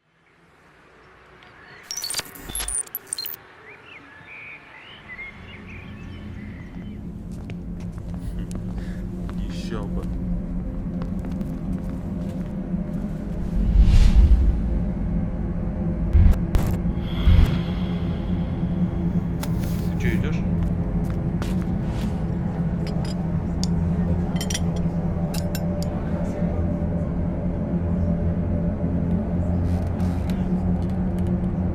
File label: ambient noise; arman syry